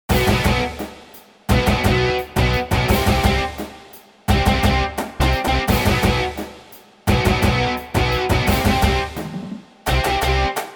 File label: rumble drums